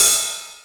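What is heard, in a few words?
CLUB ride, CLUB KIT; Wizoo - Platinum 24 Electronic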